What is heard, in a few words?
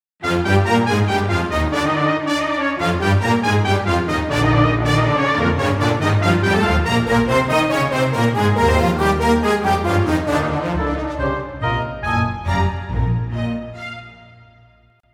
Fuge